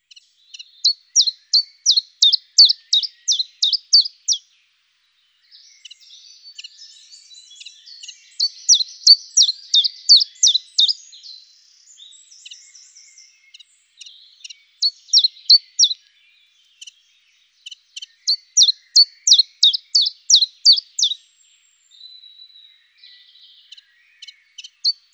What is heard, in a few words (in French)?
pouillot veloce